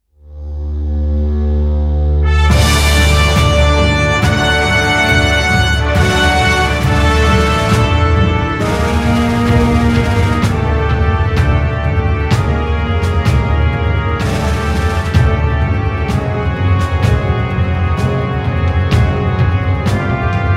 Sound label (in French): RACCORD GÉNÉRIC COMPLET